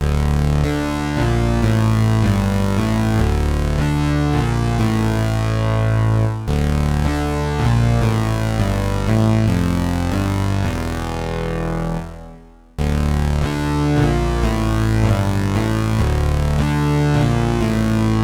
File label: Ominous in 8 Bit; chiptunes